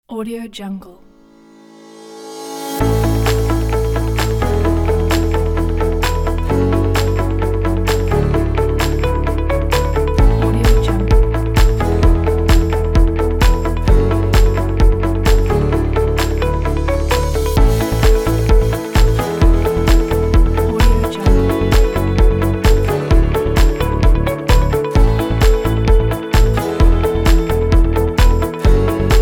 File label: upbeat corporate kit